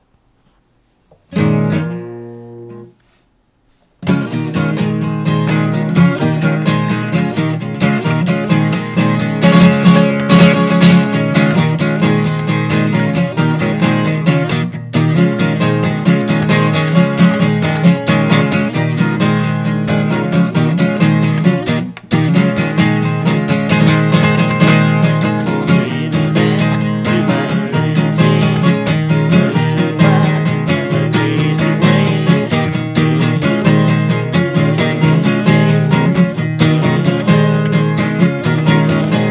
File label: Acoustic